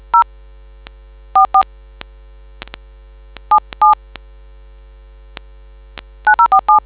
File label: dtmf